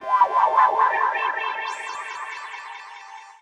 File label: KEY SHOT TEKNO 140 A; Techno2; Singleshots